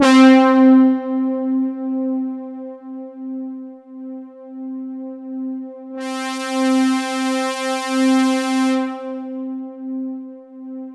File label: C3, JUNGLE BRASS; Roland JV 2080